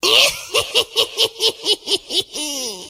laugh witch

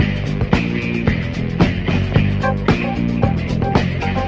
guitar and keys, Loops, Phonky

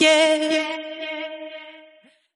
yeah, sung

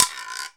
guiro; Misc Percussion

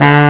sax; Winds

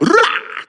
rrrrah; ooh ahh

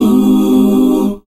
oooo, voice; Notes

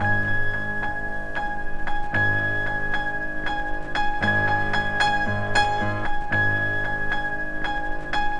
piano for dark, Loops Sad

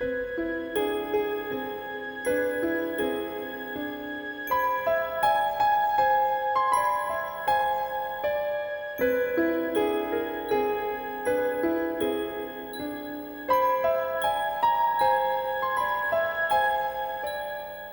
piano, Loops Sad

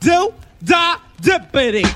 doo dah dippity; ooh ahh

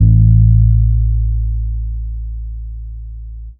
standup, Bass

